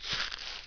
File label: step grass; SpecOps2 MPlayer Demo